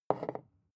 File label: glass put down wobble soft